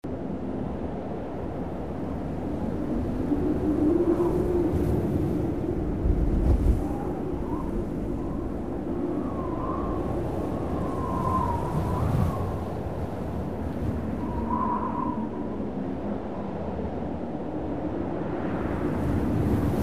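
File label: WIND Summit Fierce Gusts loop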